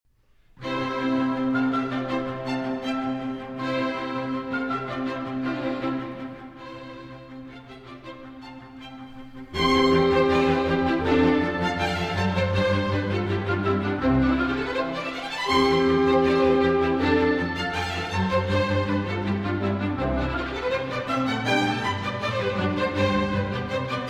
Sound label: Cello Concerto No. 1 in C Major, Allegro Molto; Hob.VIIb1